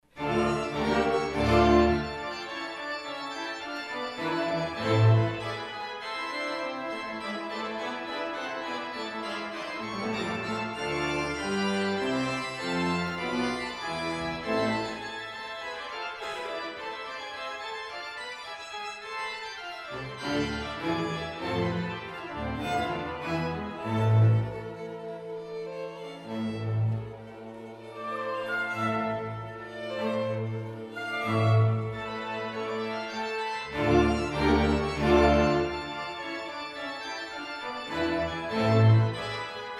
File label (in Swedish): Orgel